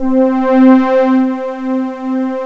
sweep; Descent

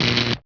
spark; Microsoft Graphics Studio Greetings; Microsoft 1998

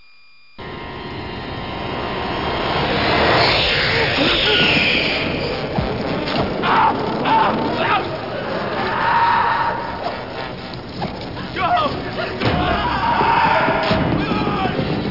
alien; SCI-FI Sensation v2.2; aliens

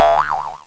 boing; Sound Effects for Multimedia - Volume 1; nature

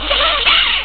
crush; GoldPusher

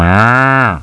Moo!; Mad Cow Roulette

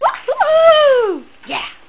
win sound!; 3D Klondike 1.0a

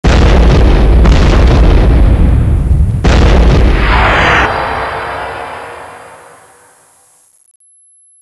spacegun

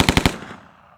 Machine Gun-Fire; 1944 Battle Of The Bulge

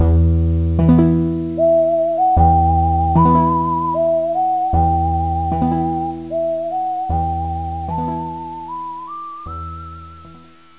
Jail Tune; Pirates! Gold

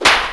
dom slap; ENOMERCY; noyz

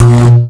runway lights on; Spearhead Demo

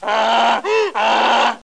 Donkey; Bible Fun Pack; NOAH

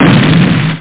star explod

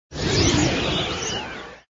Wind Transition In; moonstone madness

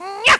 ouch; Burnin Monkey Solitaire

